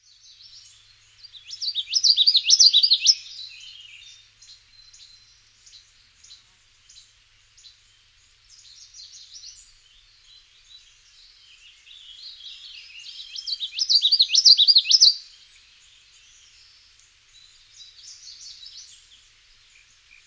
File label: common yellowthroat